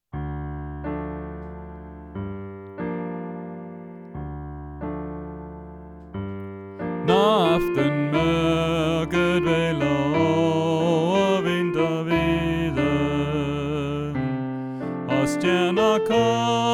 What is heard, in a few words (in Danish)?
Sopran alt; Naar aftenmoerket vaelder; oevefiler